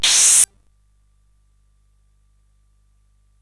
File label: Shuffle, CSG8580R5